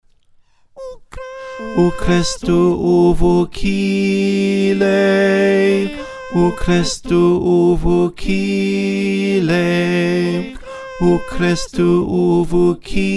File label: Bas